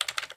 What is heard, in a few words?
typing; RS Games Sounds; Werewolf